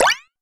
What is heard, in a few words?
click